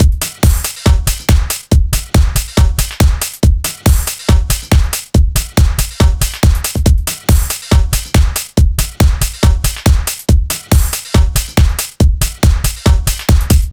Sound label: Compression, ударных техно транс; сэмплы ударных